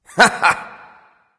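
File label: non-verbal heh heh; smite; voicepack; houyi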